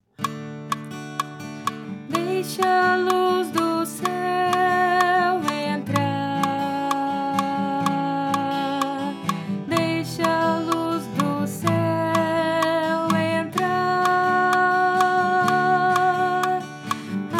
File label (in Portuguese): contralto